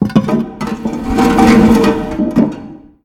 manhole open

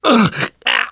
choke; namvet; Left 4 Dead 2; left4dead2 dlc2

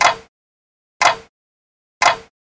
countdown

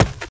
concrete, footsteps infected walk; player

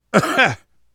laughter, survivor voice

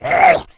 rage at victim, female; npc, infected, action, rageat

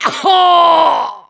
hurt critical, mechanic; voice

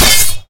melee katana; weapons

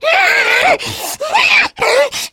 spitter lurk

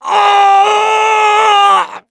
death scream, mechanic, survivor; voice

